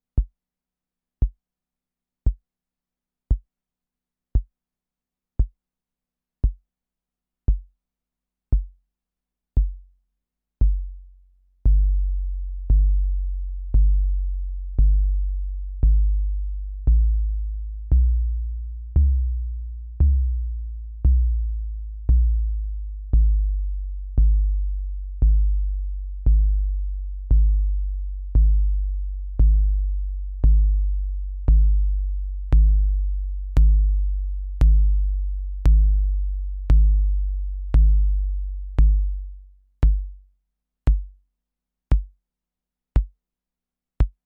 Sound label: BD Volca Beats